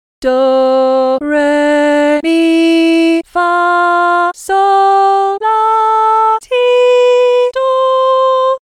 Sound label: C major scale solfa